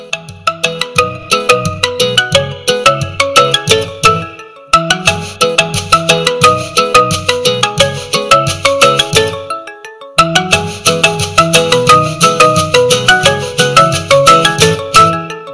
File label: Redmi Note 9 Pro 5G Original